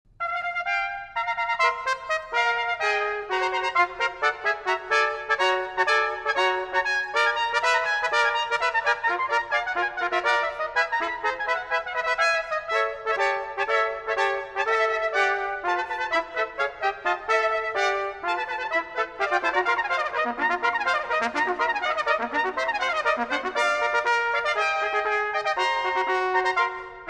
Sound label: fanfare for three trumpets